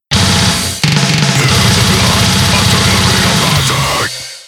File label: Shotgun; Funeral